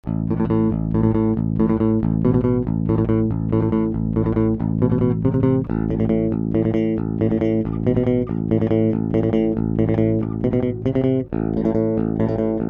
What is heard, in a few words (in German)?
pick alle einstellungen